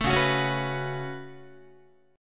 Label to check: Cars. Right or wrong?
Wrong